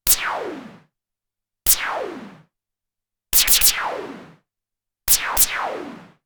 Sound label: Laser Gun Sound